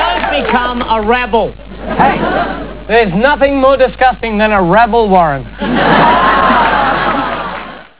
rabble

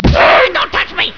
ax hit

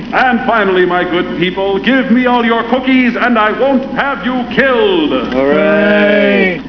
hooray; And finally my good people, give me all your cookies and I won't have you killed!

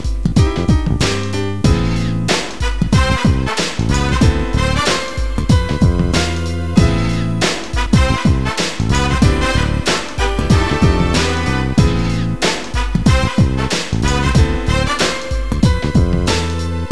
instrumental

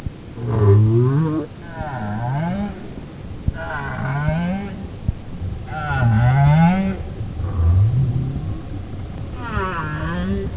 whale

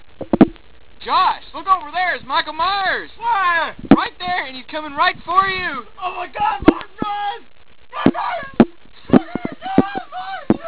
scream

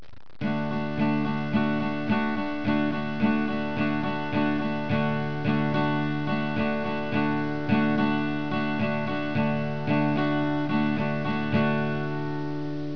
strum

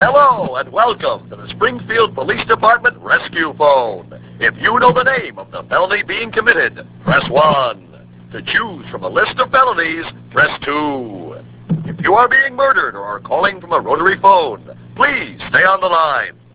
police

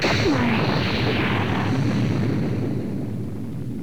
EXPLOS

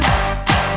Clap Clap

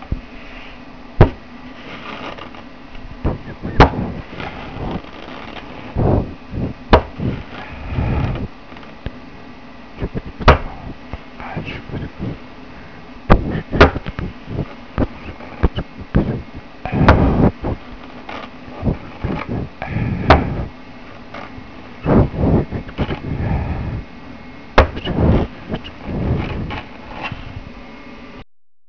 knocking; This is the recorded sound of the knocking we heard

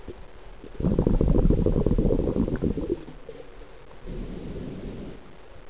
scuba bubbles

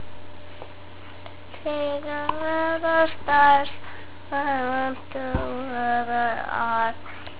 twinkle

